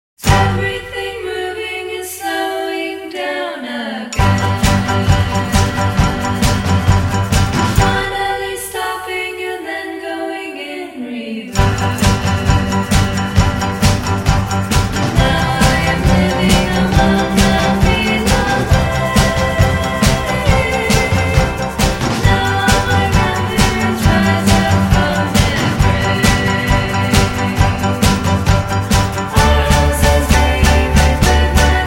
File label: triplets